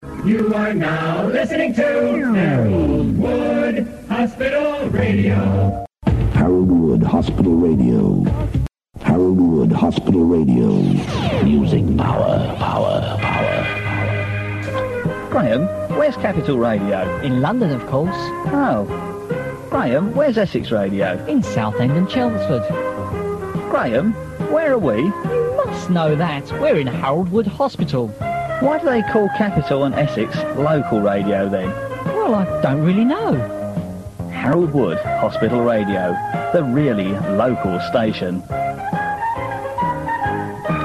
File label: JINGLES; BEDORCK